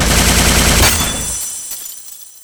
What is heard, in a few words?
Barrage Fire; nox